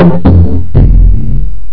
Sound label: synthbass; Instruments